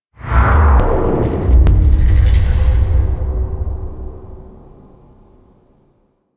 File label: Death Stinger; Duviri; Teshin Death